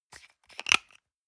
toothpaste screw cup open medium

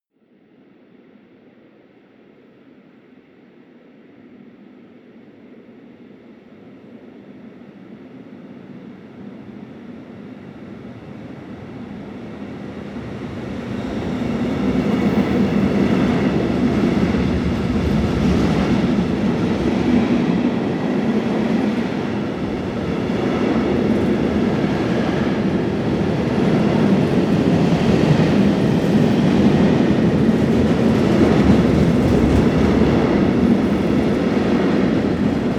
train freight drive by

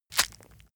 leather impact mud soft squelch

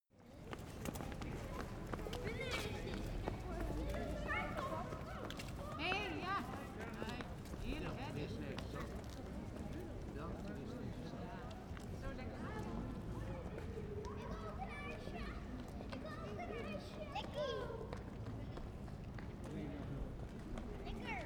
square europe day kids

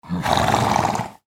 horse snorting

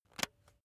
razor attachment retract soft

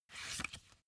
magazine flip back soft

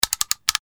switch

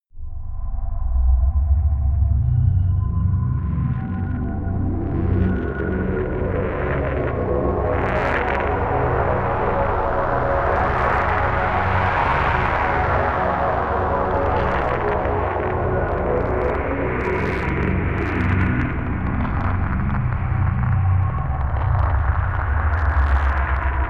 crackling ice

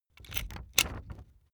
puncher operate heavy

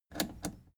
plastic button scratch click enable slow

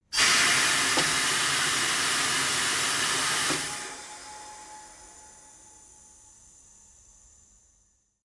tire changing machine hydraulic out

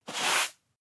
broom sweep hard

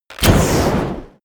bow shot fire

